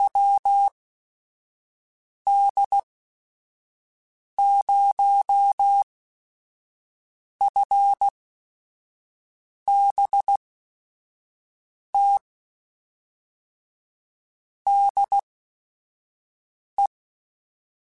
Morse Code, QSOs

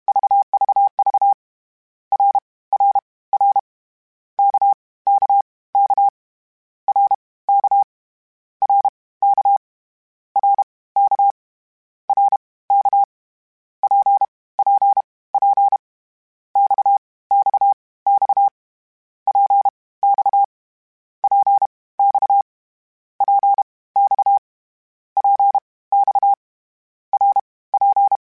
CW Code Groups Mixed Short Runs Balanced; HamRadio

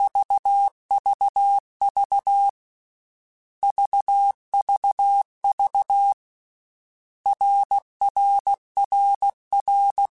RKPX, Morse Code; CW Code Groups; Basic Letter Groups By7Groups; HamRadio; Basic Sets

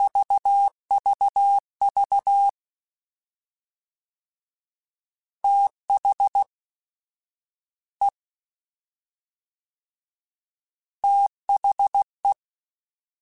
Fast TH, CW Code Groups; Mixed; Prefixes

